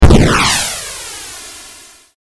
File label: attack, explosion; sfxr